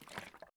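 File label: tetrapak with cap put away; Escape from Tarkov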